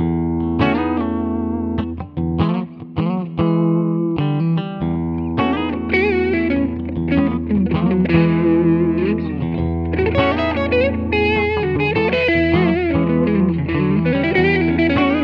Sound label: Sire Guitars H7V Clean Bridge Both; Larry Carlton